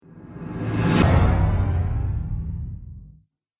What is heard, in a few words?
Cinematic Reverse Whoosh; Whoosh Sound Effects